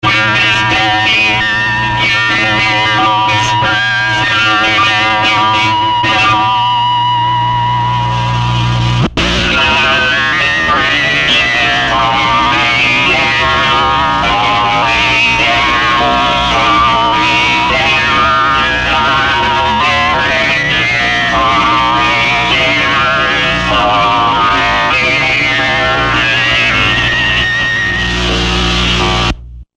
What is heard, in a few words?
Vocoder examples